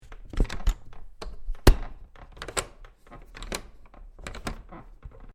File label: Closing Metal Doorknob With Creaking Wood; CraftedSignals